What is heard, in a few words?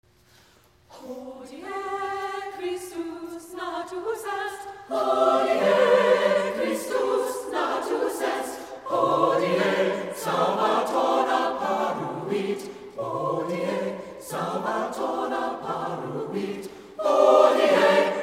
choir